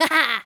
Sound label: laugh, Boys; spiritheroes